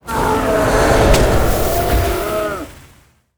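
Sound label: boss death, monster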